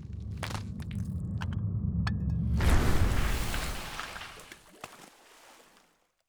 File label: Pool Pillar Break; adams venture origins